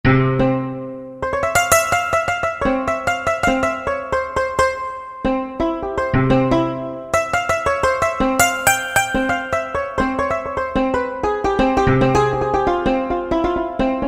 Santur, Korg N364; Factory Prog demos

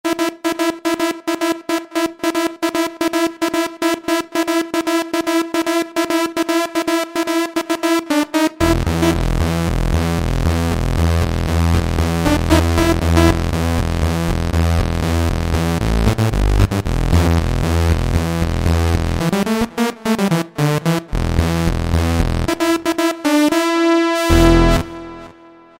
Strings-Brass; Cheetah MS6

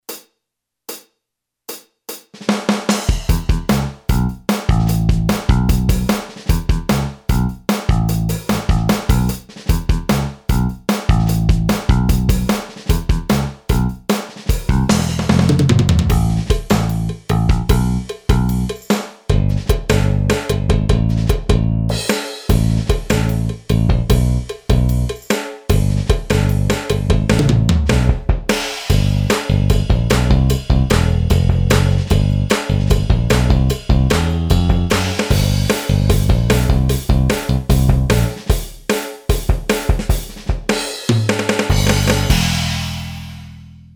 JELLY JAM, Boss DR-3

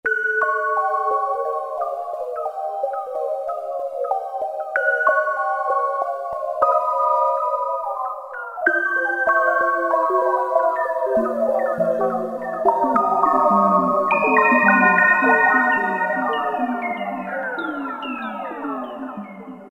Bubbl, Yamaha TG500; Internal Voice I

